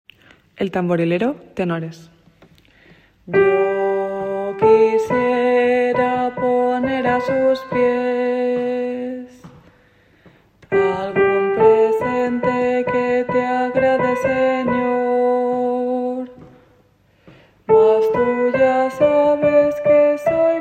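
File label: tenor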